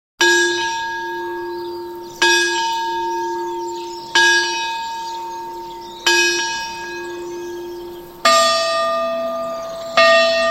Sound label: Village Church Bell Village, churches bells; Churches Bells & Clocks; Hollywood Edge Historical Series